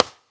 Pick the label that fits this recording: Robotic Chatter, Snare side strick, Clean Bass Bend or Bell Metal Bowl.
Snare side strick